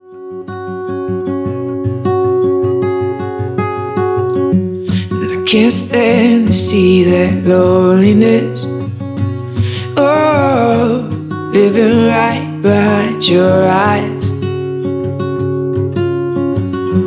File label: Acoustic Singer Songwriter